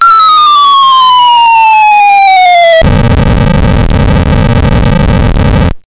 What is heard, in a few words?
BOMB AWAY